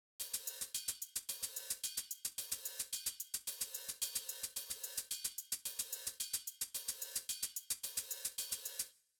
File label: intro HiHat